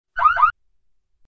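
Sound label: car alarm key fob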